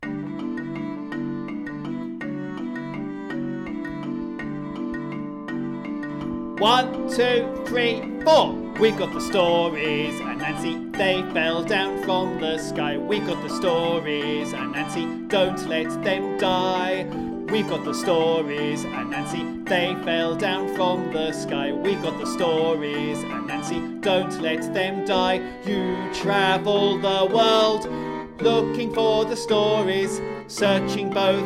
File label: Anansi song verse 4 with voice; Teachers' guide tracks